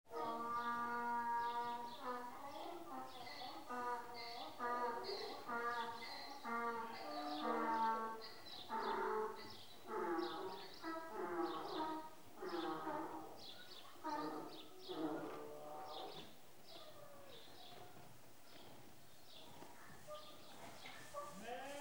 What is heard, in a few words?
Donkeys in shed braying & eating; Animals & Birds; The BBC Sound Effects Library